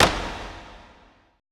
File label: E STEPS, ETH PERC